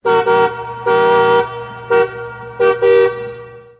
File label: car horn; wake-up-tones